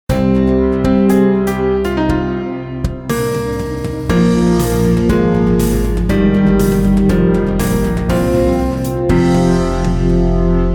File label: win Fanfare; Ring Runner: Flight of the Sages